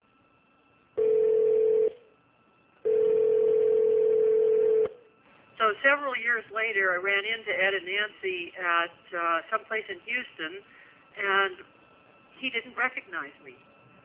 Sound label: pinball